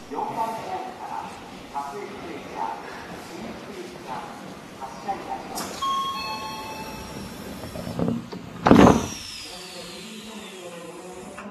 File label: vvvf, mitsubishi